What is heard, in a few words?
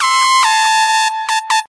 Electronic Siren